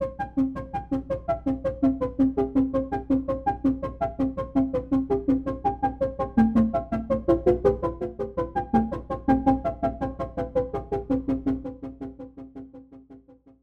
synth

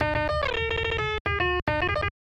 the piano in question

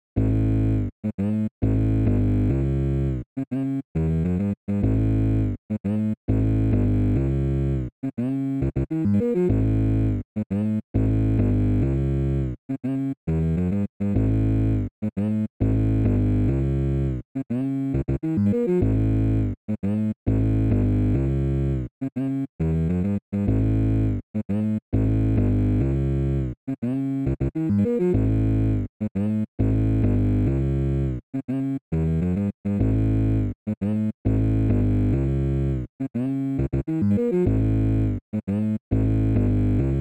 bass; ytpmv

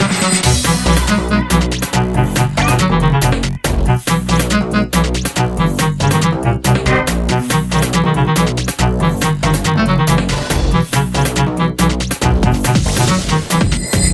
mashine funk

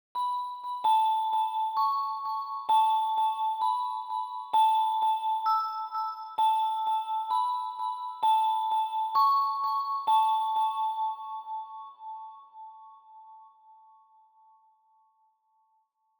synth; fl soundfont player

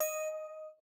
Ping isolated

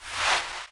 slide Closed

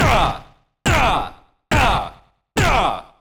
pizza hurt

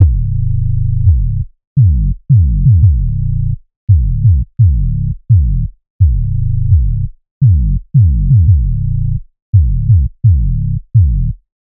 sugar sub 170bpm

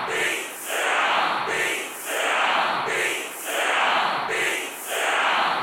pizza chant

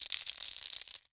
Coins Drop; SFX Producer

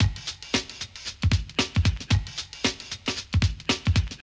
loop

